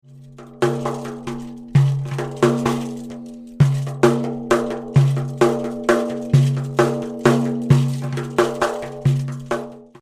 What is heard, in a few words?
membranophone